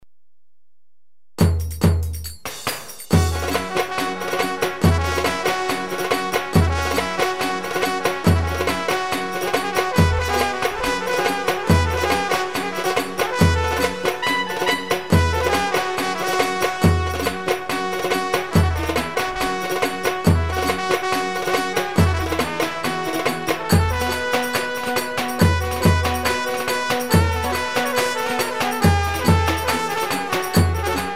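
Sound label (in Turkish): ağır çiftetelli, SX3000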